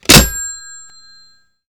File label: slam down the bell phone